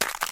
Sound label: ice footstep; everness